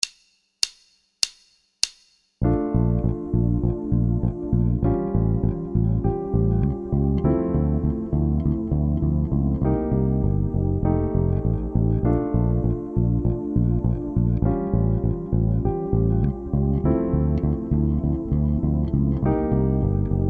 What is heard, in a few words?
Basic 8th, drums